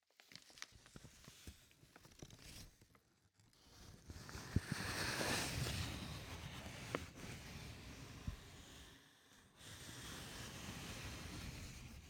紙を擦る音; ハイレゾ

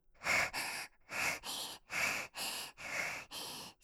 セックス 息のみ; カスタマイズ用音源

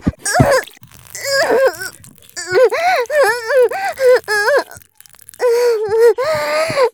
絶頂 潮吹き; 騎乗位

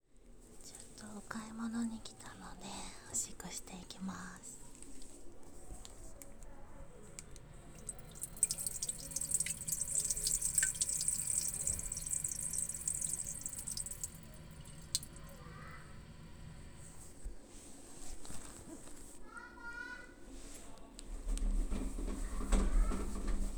買い物先のトイレでオシッコ; ４日目

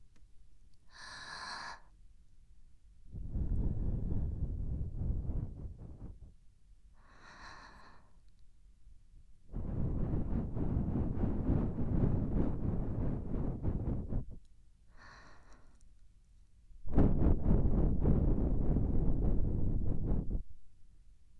バイノーラル 黒耳 耳ふー; ASMR・お手軽〜高額バイノーラルマイクの差を調査隊!!

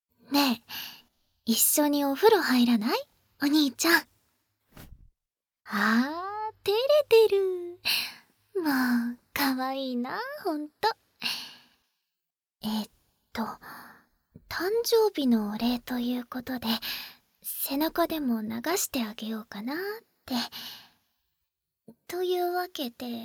潤いボディのシャンプーヒーリング

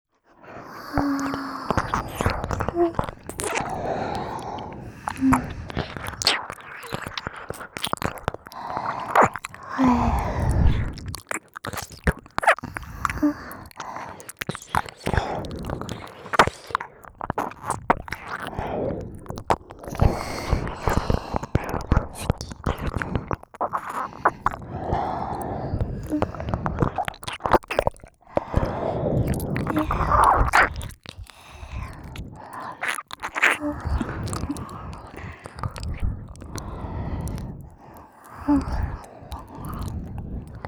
姫とメイドの耳舐めオンリー; KU100; 巨乳お姫様; 低音デカ尻メイドさん

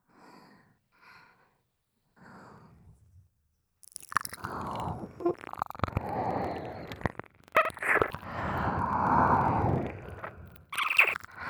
指ズボ・リラックス耳舐め, ASMR

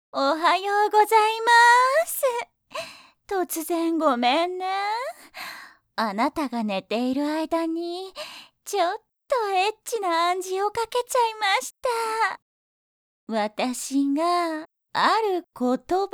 本編射精音・ピストン音付き; どぴゅ!って言われる度に射精してしまう暗示をかけられて、無限に射精してしまう音声。

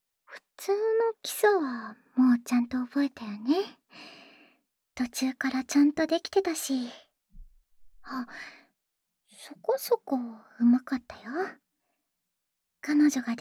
優しく、大胆に絡めてみようね（ディープキス、れろれろキス）; 青春べろちゅー委員会

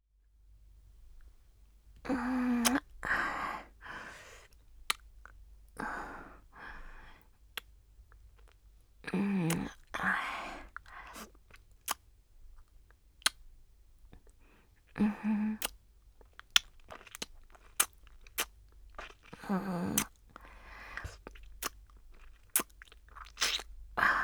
キス; 【マゾハーレム】

